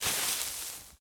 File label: leaves step; Golden Crayon Sounds